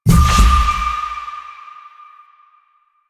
Ring Success; Warframe; Jade Shadows; Quests; Birth Minigame

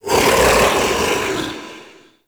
Mockery Male Vocal Charge Scream; Characters; Enemy; Vocals; Mockeries

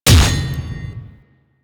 Hammer Glass Impact Default D; Warframe; Weapons

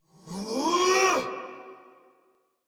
Attack Effort; Warframe; Dax Ashigaru; en

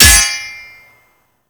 parry sword; Warframe

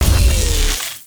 Turret Chain Lightning Close; Warframe; Zariman

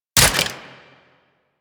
Sniper Rifle Mech; Gorg

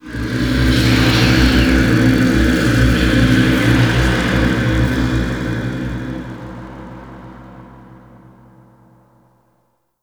Tomb Entrance Sting; Mummy Tomb

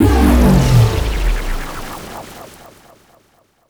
UI Purchase Success; DE; Warframe; Market

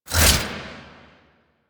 Whoosh A, weapons; Warframe; Gunsen Prime

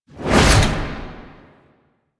Alchemy Grenade Throw; Warframe; Entrati

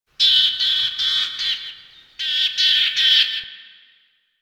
Ambient Bird Calls; Ambience; Duviri; Warframe

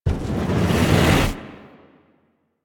Operator Focus Blast Fireball Charge; Warframe; Operators; Powers; Attack

